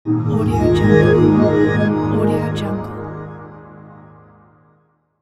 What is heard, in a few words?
soft motion intro logo; slavadyadyun